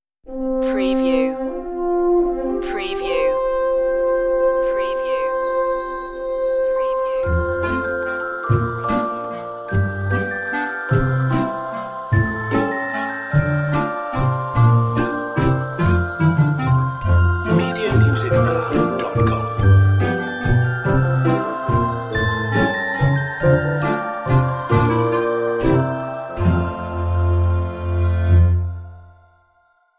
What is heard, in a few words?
jingle bells commercial